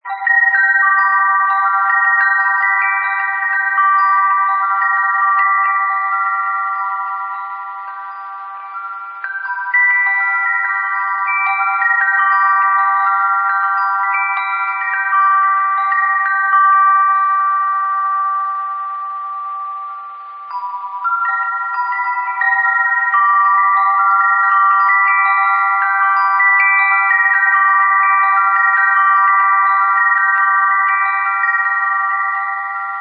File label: the blues wind chime